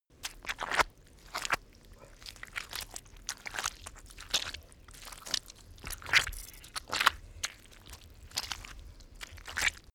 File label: step mud walk; schritte